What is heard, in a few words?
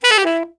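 RIFF DOWN, TENOR SAX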